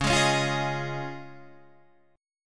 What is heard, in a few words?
Db Major Add 9th, Guitar Standard; strummed; 9,11,11,10,9,11